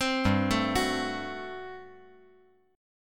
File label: G Major 7th Suspended 2nd Suspended 4th G down